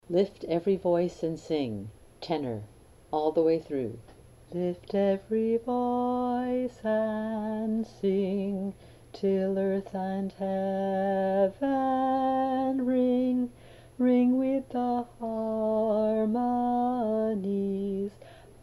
Lift Every Voice and Sing tenor

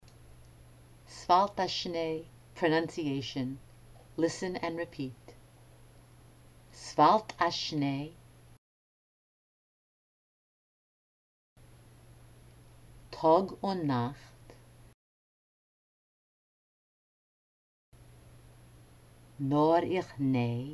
Pronunciation Drill; S'Falt A Shney

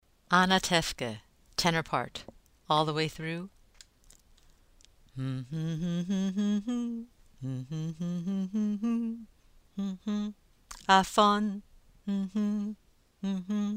tenor; fiddler medley

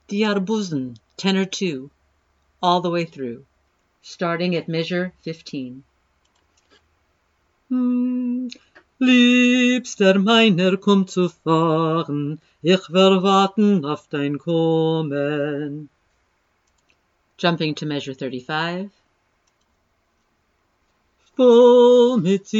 Tenor II; all the way through; Di Arbuzn

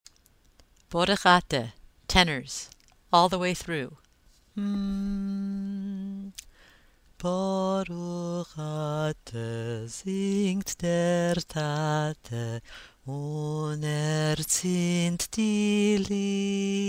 tenor; Borukh Ate

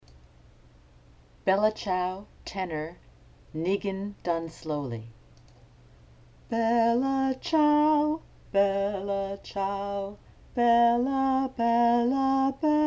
Tenor Nign Slowly; Bella Ciao

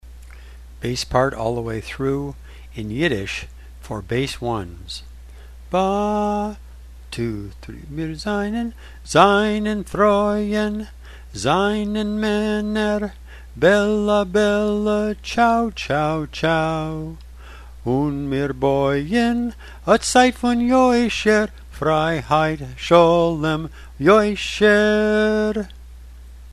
Bass - Yiddish; all the way through; in Yiddish; Bella Ciao

